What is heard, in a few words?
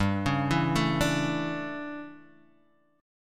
D Major 7th Suspended 2nd Suspended 4th, G